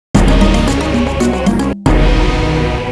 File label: you lose; Tak Mojo Mistake